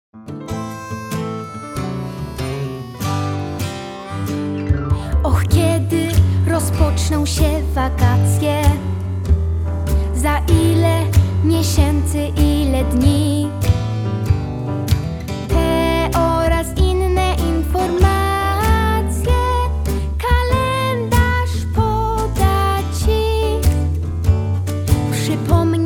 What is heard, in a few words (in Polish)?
piosenka o kalendarzu; szkola na tak